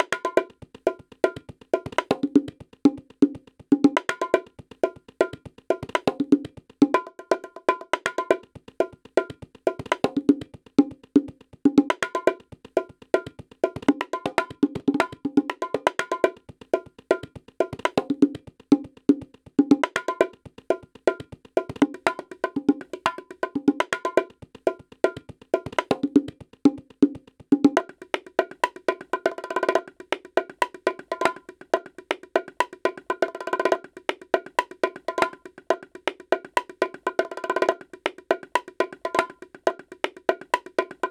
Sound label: groove